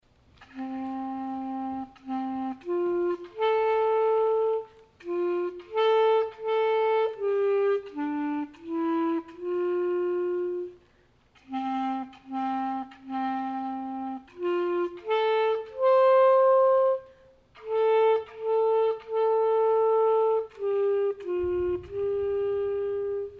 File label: instrumental